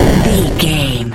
retro explosion deep

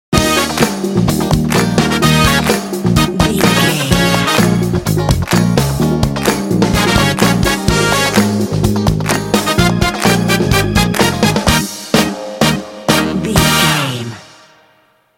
hit me funk sting

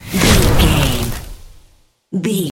nature spell vines whoosh

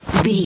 foley object throw move

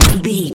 gun silenced pistol shot

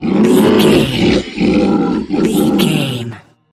troll monster laugh

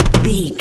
footstep metal land

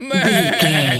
goat baa stressed hurt

